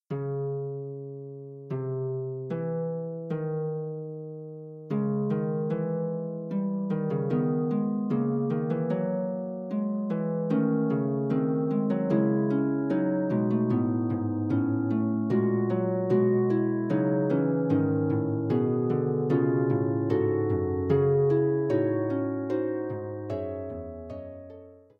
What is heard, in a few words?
Fugue, Harp; Forty Eight Preludes and Fugues Book 1